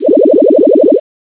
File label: ringer